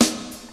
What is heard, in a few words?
rich top end jazz snare one shot a sharp key